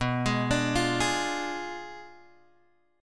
B Diminished 7th B down Guitar Drop D

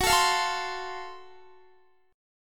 Gb Minor Major 7th Flat 5th, Guitar, chord; strummed

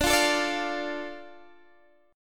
D Minor D Guitar Open D

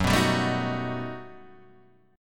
G Augmented Major 9th

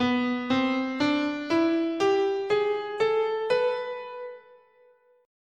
B Symmetrical, scale

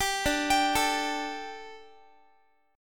D Suspended 4th D, Guitar; arpeggiated; 22,22,22,19,x,x

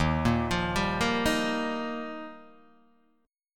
Eb Major Add 9th, Guitar, Open D

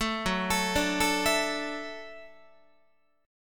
F sharp Minor 7th Sharp 5th, F sharp, Guitar; arpeggiated; 4A,4E,5A,5D,5G#,6C#; 12,14,12,12,13,15

